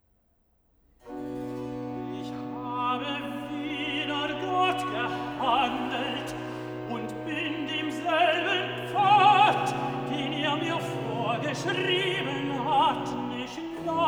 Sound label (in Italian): Cantata, Recitativo (Tenor); BWV 55 'Ich armer Mensch, ich Sundenknecht'; Bach; Tooting; 22nd Sun After Trinity